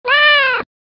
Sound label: meow scratch sound effect